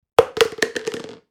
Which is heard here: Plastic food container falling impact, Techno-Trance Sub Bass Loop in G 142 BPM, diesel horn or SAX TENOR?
Plastic food container falling impact